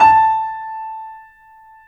A, Piano; InVision Interactive - The Piano

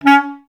BCS STAC C#3; Best Service; Peter Siedlaczek's Advanced Orchestra

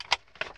on off, Switch FX; Masterbits

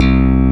SLAP BASS; Kid Nepro; Elite

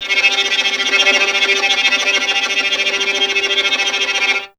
STR VLN, Vln Trem; Miroslav Vitous

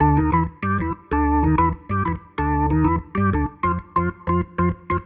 jazz keys Organ 95 B-line n Riff

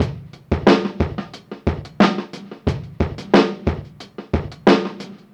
Drum Loop, Drum Loops

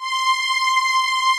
ANALOG STR; Pro-Rec - Synthsonic; TRINITY

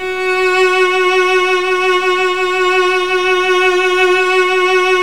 Virtuoso Strings

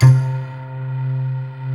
Glass Tamb; Best Service Gigapack 1

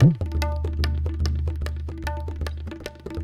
Tabla

